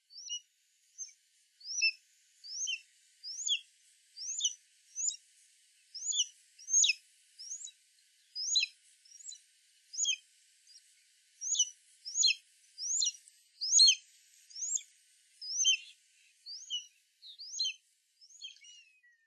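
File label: common buzzard, aomori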